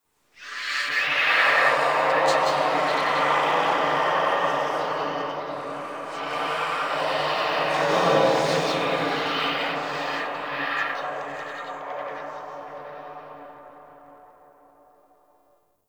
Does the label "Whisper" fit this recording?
Yes